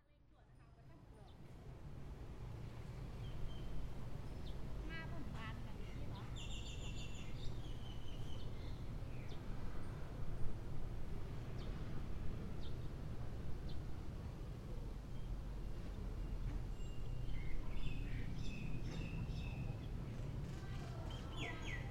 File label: Field Recording Wat Huan Wiang Mae Hong Son; Identity Lab; Philip Reitsperger